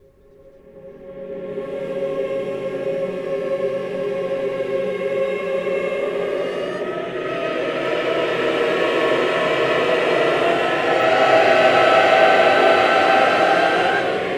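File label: AVANTE reverse, Voices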